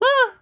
Spa Hit

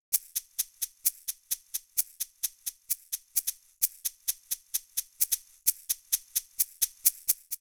Hihat 16 beats

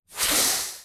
Mech Footstep Air; starbites

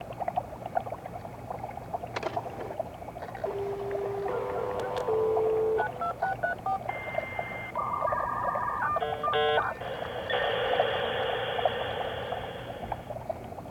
Desk Amb; BopIt SFX DeskAmb 2

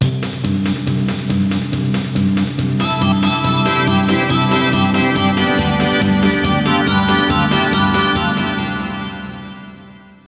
Str G#

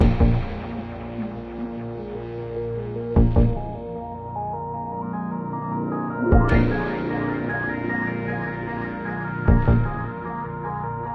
Music Hub Scifi

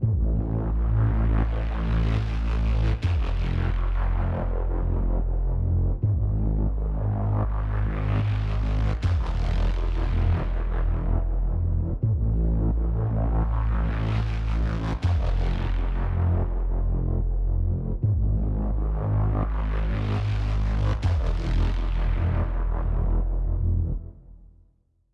Sub Loop; Agnitor

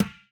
Physics Object Gym Ball Impact; viewfinder

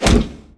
Damage Stick

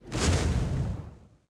Fire totem shoot; Folk Hero

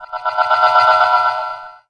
Teleport

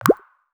Play Button Pressed

